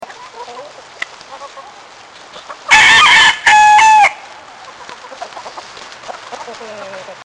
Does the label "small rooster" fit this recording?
Yes